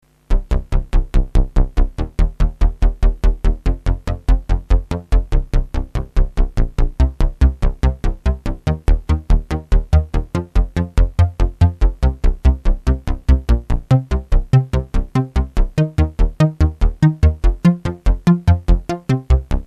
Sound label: arp oct